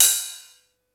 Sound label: Misc Cymbal; Mo' Phatt; Digital Sound Factory Mo' Phatt